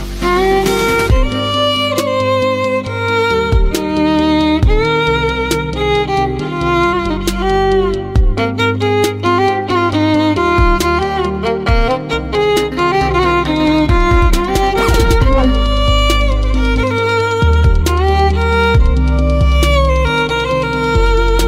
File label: Violin Cover